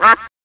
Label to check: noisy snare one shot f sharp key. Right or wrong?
Wrong